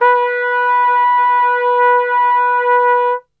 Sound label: B 2, Brass; Best Service; Brass Super Section